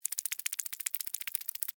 Insect Run Wet, Slimy, Bug; Animal

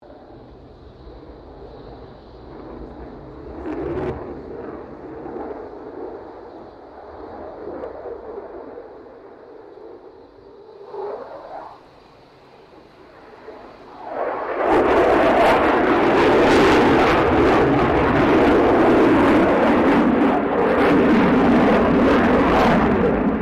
AIRCRAFT FA-18 HORNET PASS; RAAF FA-18 Hornet; Airplanes; Sound Effects:Vehicles

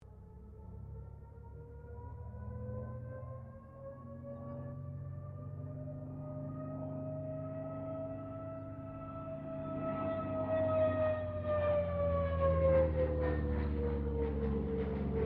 AIRCRAFT CA-13 BOOMERANG PASS DISTANT; Airplanes

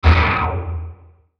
Hit Low Burst, Hit Low Burst Descend; Production Element Imaging Element Accent Transition; Bass Buttons; Blastwave

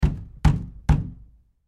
Door Knock Fist; SoundEffects-Blastwave

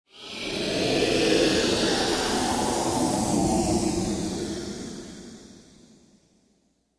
Sci Fi Spaceship; Sci Fi Spaceship Whoosh Airy Swirl; Spacecrafts

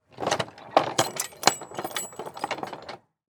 Truck Gate Lower; Truck Sound Effects; Vehicles; SoundEffects-BlastWave

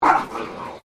Animal Dog Chihuahua Bark Single Snarl; Newcastle; Various domestic dogs; Dog Sound Effects; Dogs (Sound Effects:Animals); Foundation1

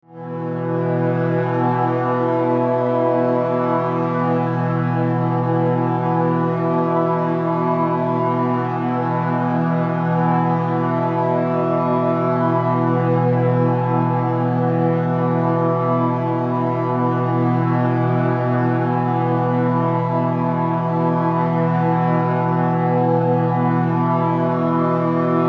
Bed Reverb Chorus, Bed Strings Resonance; Bed Sound Effects; Blastwave; Production Element Imaging Element Accent Transition

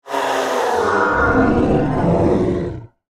Monster Bellow, Horror, Monster, Bellow; Monsters (Sound Effects:Horror); BlastWave

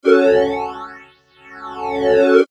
Logo Filtered Swells; Sonic Logo; Blastwave

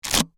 Paper Tube Crinkle, Foley, Paper, Tube, Crinkle; Materials; BlastWave

